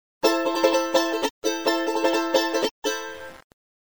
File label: potosi, charango; folklore